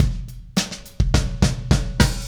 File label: Beat Fill, Indie Rock; Sonic Foundry (Sony Creative Software); Indie Rock Anthems